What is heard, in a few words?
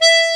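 bayan E6, Russian; EdgeSounds; Drum Mashines VOL-1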